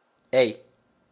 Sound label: key